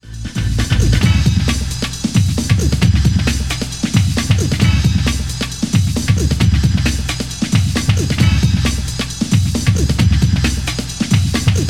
Beat

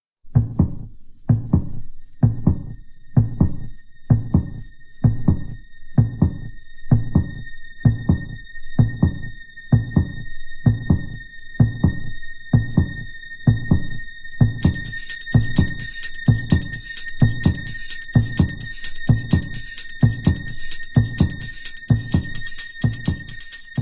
Heartbeat & high string note

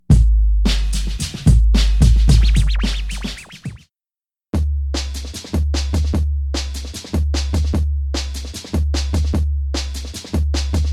break

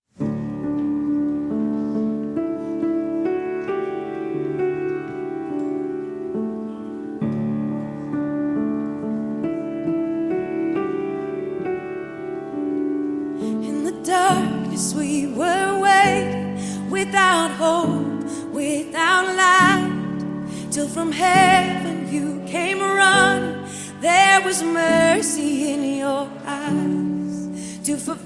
gospel choir

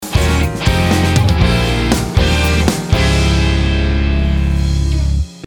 polychords

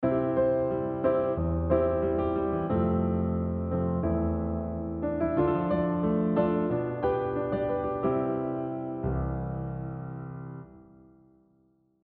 smooth chord progression

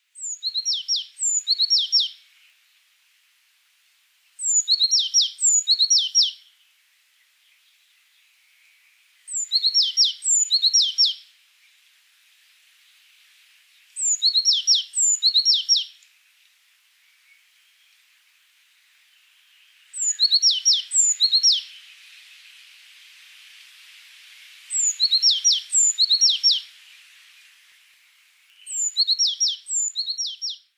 Cyanistes cyanus

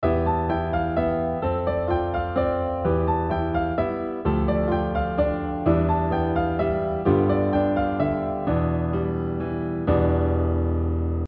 modal - ostinato; modal harmony

